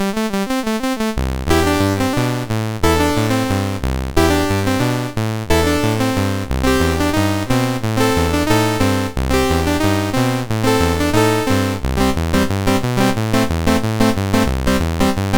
Shout, MOS6581R4

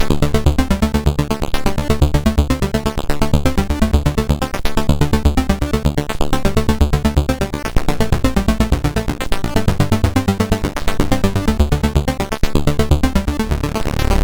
Dreckpulse Short sid MOS6581R2

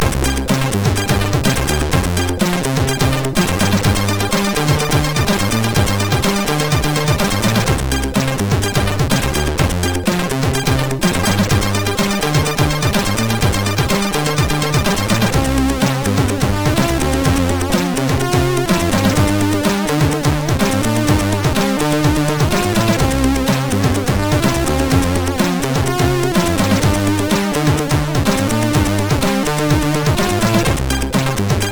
Funky Balade, MOS6581R3

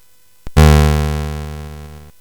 Space Gun

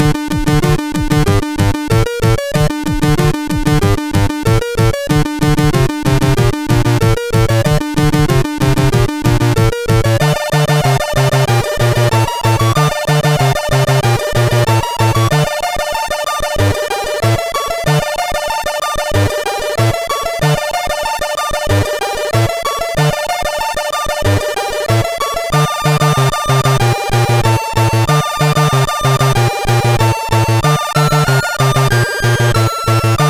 Zene,sid CSG8580R5

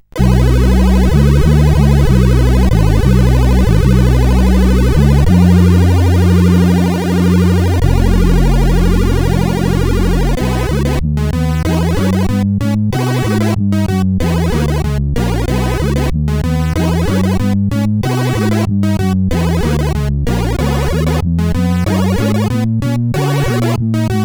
Walking Bass, CSG8580R5

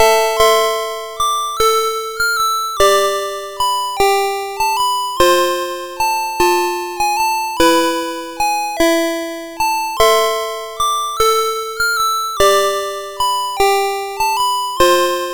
Greensleeves Traditional, MOS6581

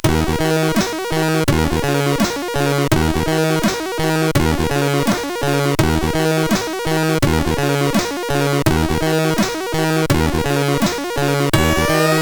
Industrial, CSG8580R5; Digger; hvsc

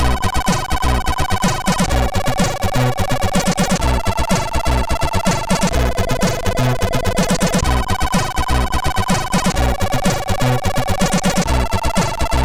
Echo Effect, sid MOS6581

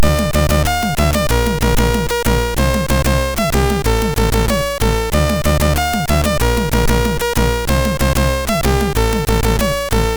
Modulation, MOS6581R3

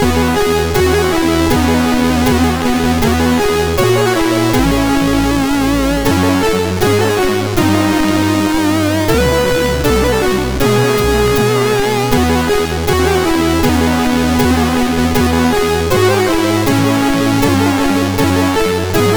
Christmassy, MOS6581R3

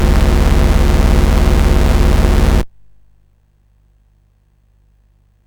Dragonworld sid CSG8580R5

